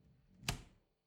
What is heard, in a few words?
refrigerator door close moan